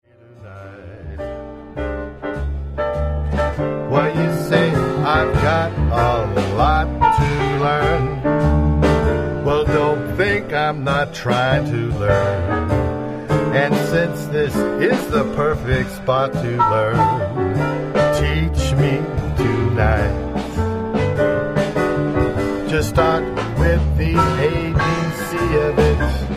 Male Vocal; Ballroom Dance Music Band

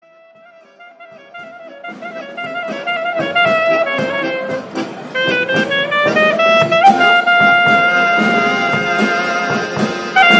JEWISH KLEZMER; Live Band; Jumelles Jazz

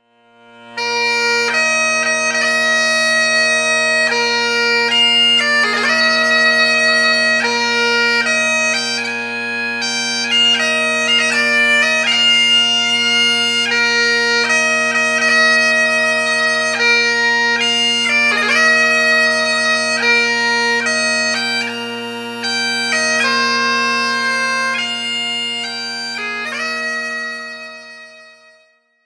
Here Comes the Bride, Bagpipes; Folk

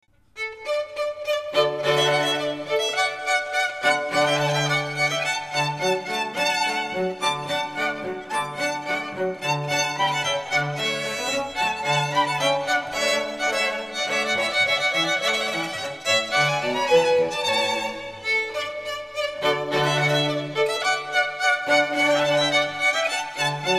String Ensemble; Classical; Handel

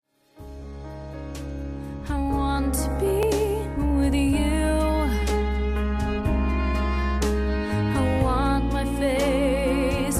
vocal

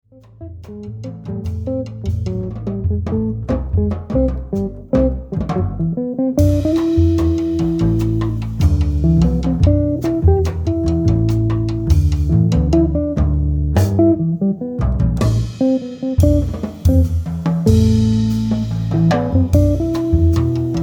Latin Trio

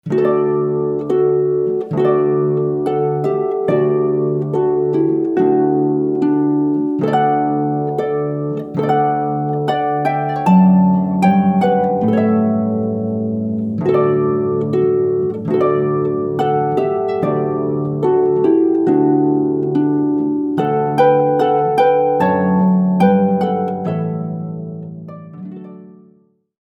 Harpists; Classical

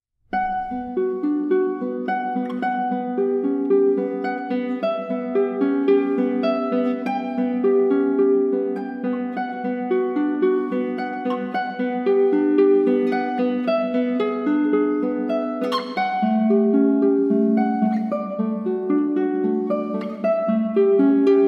La Catedral Preludio, classical guitar; Barrios

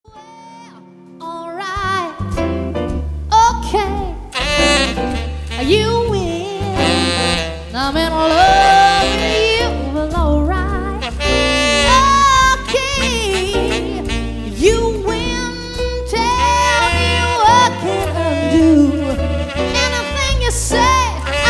Jazz Duo

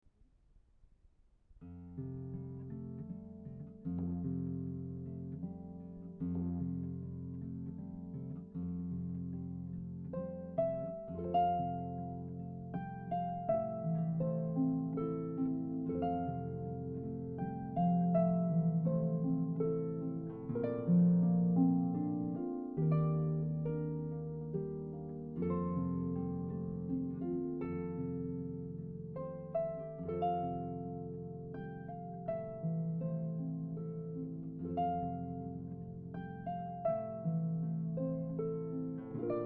harp

